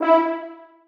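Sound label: horns staccato e4; Sonatina Symphonic Orchestra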